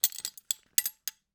jiggling belt buckle; Sonniss GDC

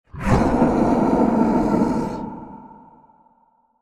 great ancient shouting, creatures

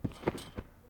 trampoline realistic

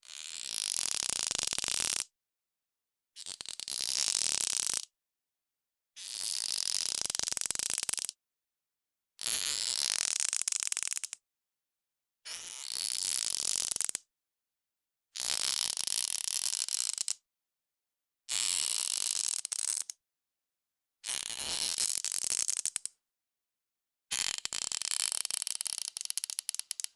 squeaks and creaks metal coathanger slow; ntg3; Sonniss GDC

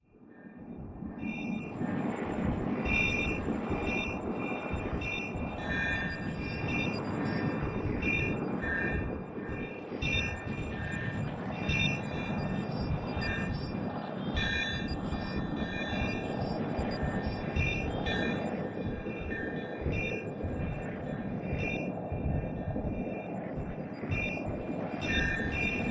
soundscape crystal; quantum state; Sonniss GDC

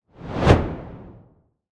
spell whoosh; sonniss gdc

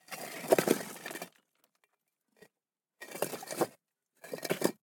designed rocks raw; sonniss gdc

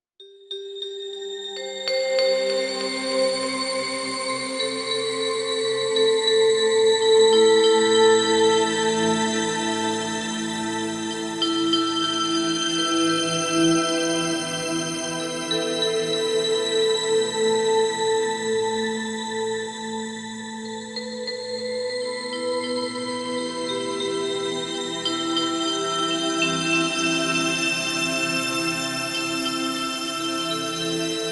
enchanting bells; fvtt sonniss gdc audio

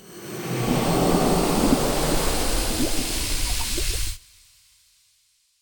poison spray, cantrips

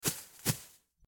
footsteps grass; weather and nature

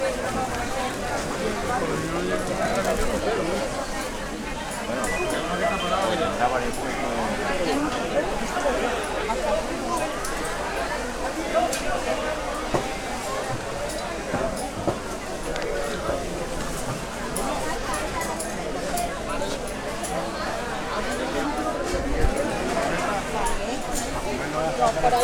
market, Town; Loops

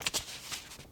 turn page thick magazine; sonniss gdc

